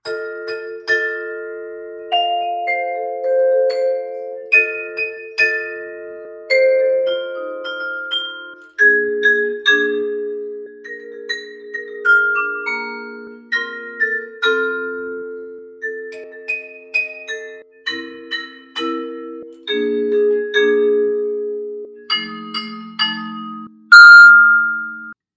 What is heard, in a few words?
vibe jam with fx